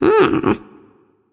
nv heh heh; smite; voicepack; ratatoskr

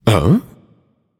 notice; smite; achilles

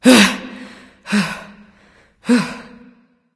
nv breath; smite; awilix

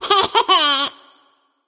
nv tongue; smite; voicepack; ratatoskr

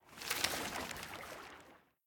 water under swim; sobek; smite

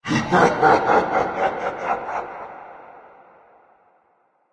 halloween laugh long; smite; thanatos

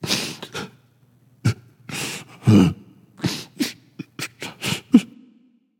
boohoo; smite; apollo

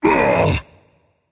grunt hit rage; chaac

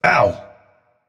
ouch; smite; arthur

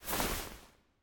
Armor; Smite; god Kumbhakarna

